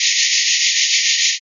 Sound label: toothbrush